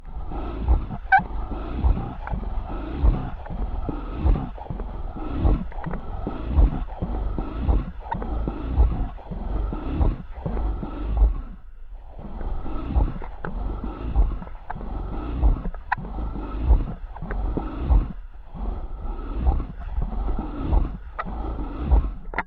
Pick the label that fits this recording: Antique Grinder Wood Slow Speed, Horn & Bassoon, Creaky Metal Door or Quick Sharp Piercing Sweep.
Antique Grinder Wood Slow Speed